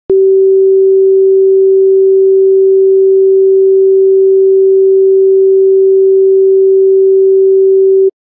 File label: rescue tones